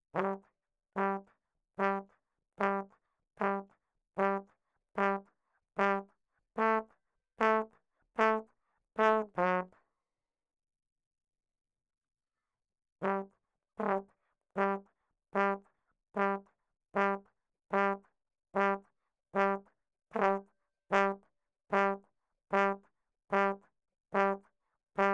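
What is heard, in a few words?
RAGTIME trombone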